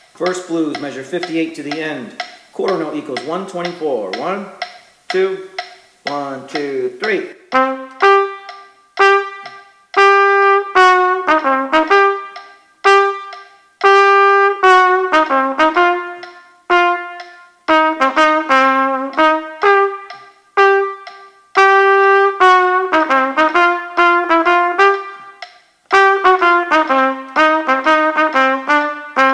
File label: First Blues, with click, qn=124, trumpet; Play-a-long; m58-end; 12 Medium-Easy Etudes